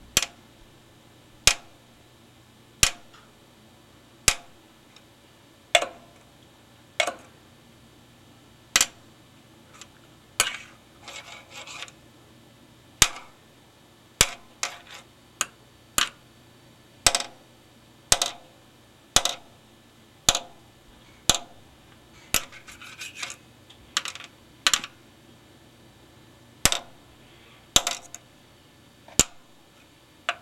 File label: sound pen tapping